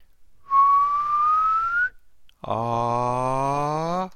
whistle voice

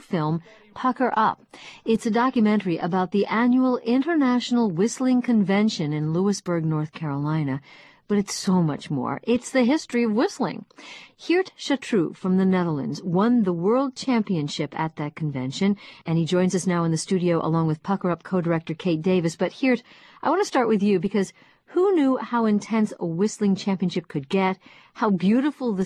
pucker

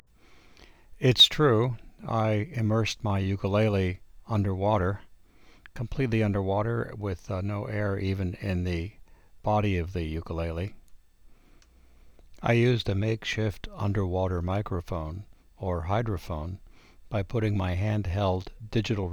underwater ukelele

